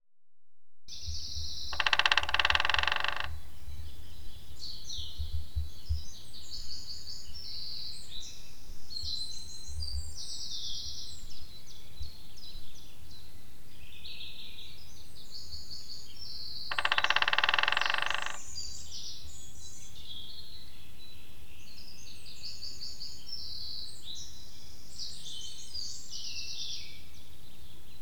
fehérhátú fakopáncs dob

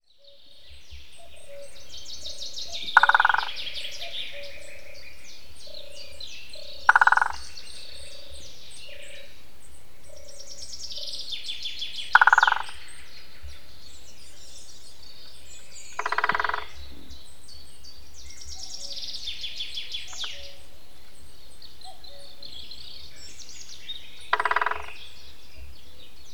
nagy fakopáncs dobolás

